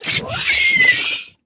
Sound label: Exploding Bloatbat